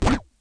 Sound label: machine